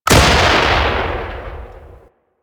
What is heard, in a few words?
Mortar Fire A, Rocket Swarm Launcher; Warframe; Ambience; Eidolon Plains; Grineer Emplacements